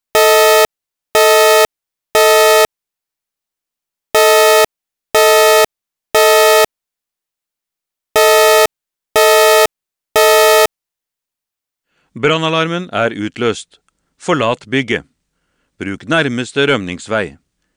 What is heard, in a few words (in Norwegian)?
alarmtone Evak uten heis; talevarsling